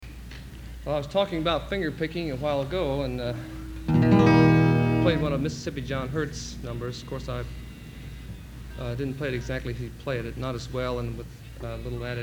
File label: Applause